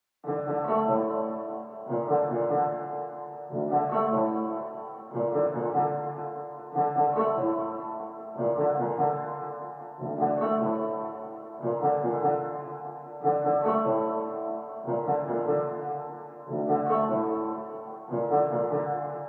148 BPM D Min Drill Piano; Loop Stems; Cymatics; Audemars

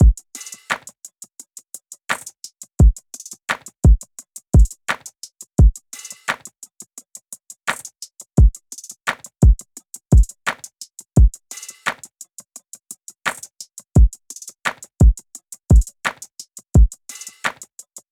Drum Loop - 86 BPM; Sky; Cymatics; DOPE DRUMS VOL. 1

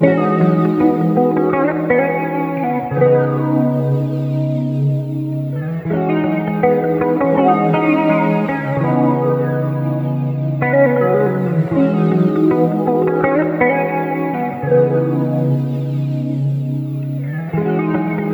Guitar Loop - 82 BPM E Maj, Lofi; Guitars; Dreams of Then; Cymatics; Lofi Starter Pack

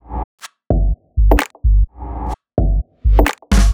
Top Loop - 128 BPM G Min, Top Drum Loops; House; Cymatics

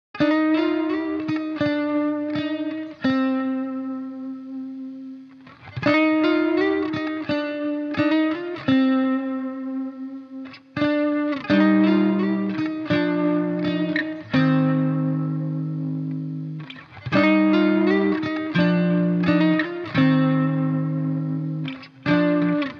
85 BPM C Min Lead Guitar; Loop Stems; Cymatics; Full Moon

